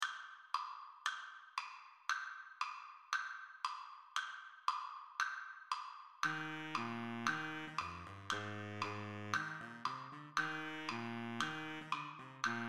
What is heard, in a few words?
Baritone Saxophone; Bari Sax Only